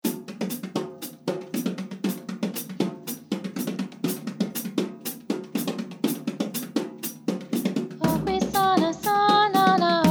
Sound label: with Vocals and drums; Obwisana